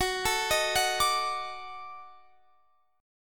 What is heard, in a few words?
D sharp Minor Major 7th Flat 5th F sharp